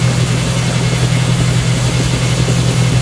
drill loop; movers objects

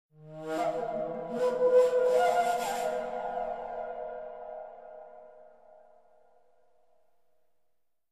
pipe; Myst III Exile

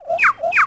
call; Dino

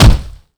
bail body punch; skater; Tony Hawk's Project 8; XBOX

